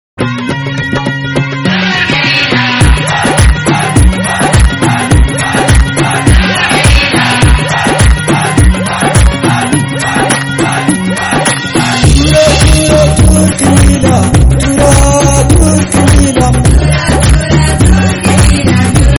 punjabi

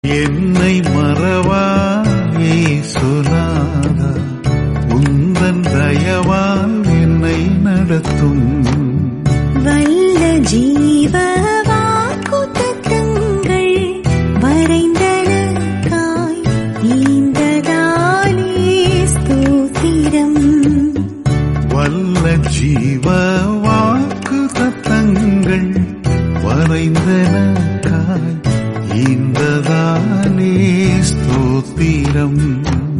tamil christian song

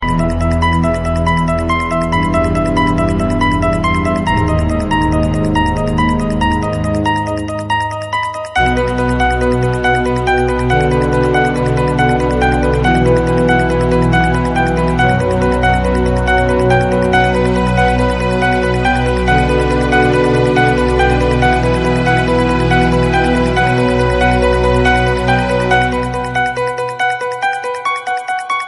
scary ringtone

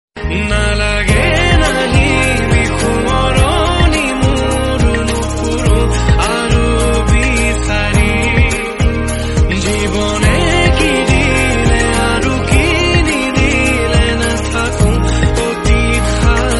assamese love song

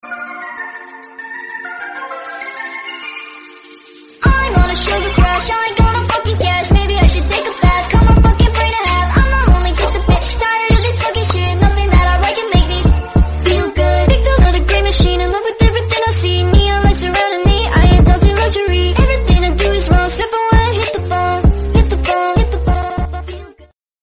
english song

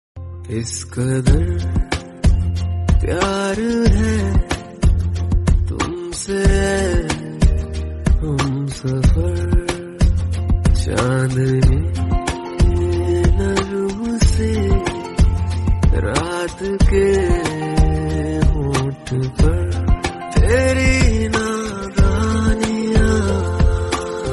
romantic hindi

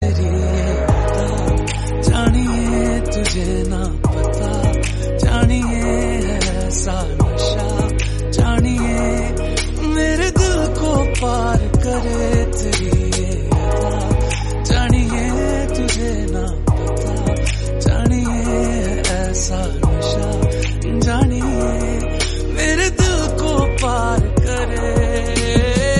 hindi song

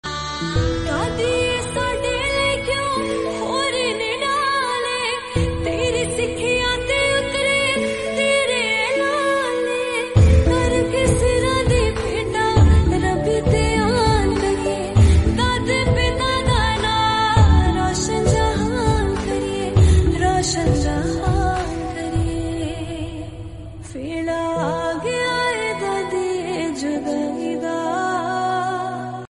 punjabi; chaar sahibzaade

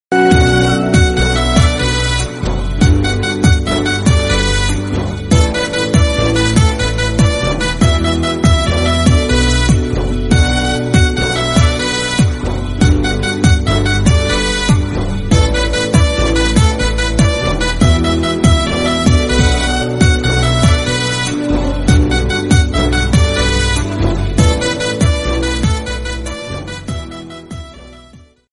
Instrumental

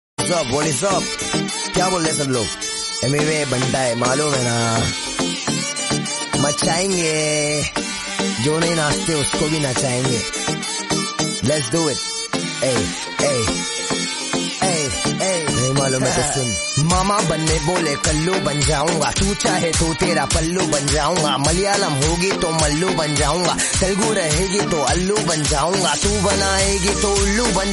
lofi rap song

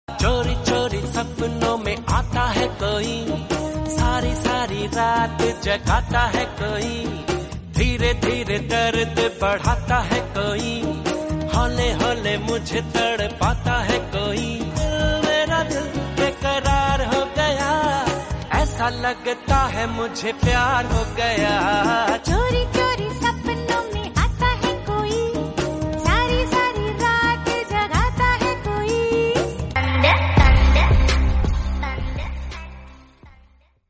2000s Bollywood Hindi song

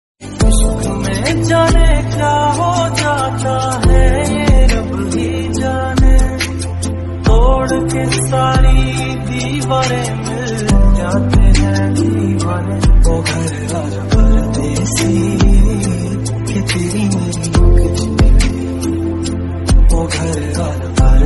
lofi

